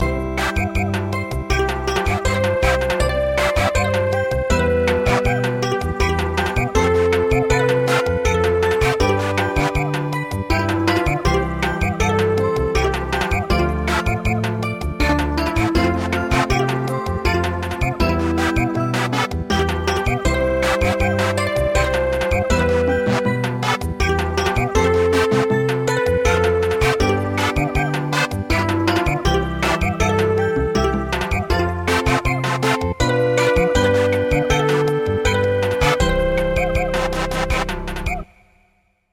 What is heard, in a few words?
Samba, midi